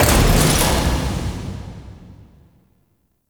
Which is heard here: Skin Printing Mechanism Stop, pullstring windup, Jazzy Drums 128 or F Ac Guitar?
Skin Printing Mechanism Stop